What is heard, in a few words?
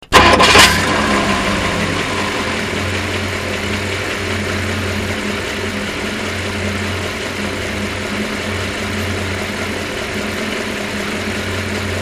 1964 ford thunderbird